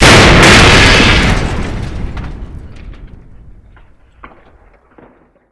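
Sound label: explosion metal armor distant; Explosions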